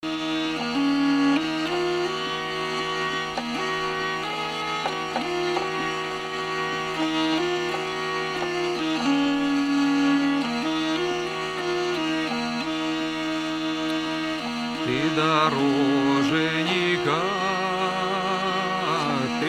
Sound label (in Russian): Ансамбль Ты дороженька духовный стих